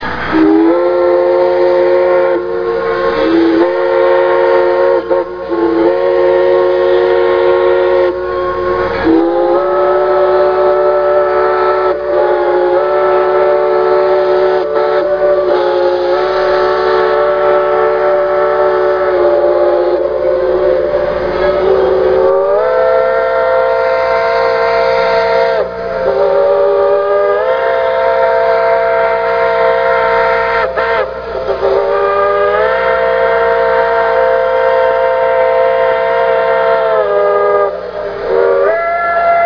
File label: tones